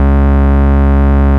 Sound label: Vengeance Ultimate Bass C#, Root C#